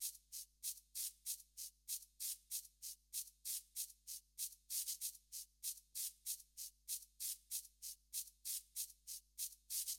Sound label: TOPS, viral hiphop